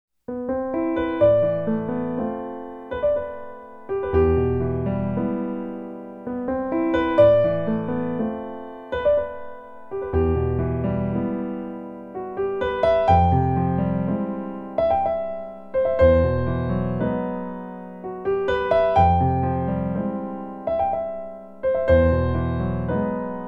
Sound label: Prelude in E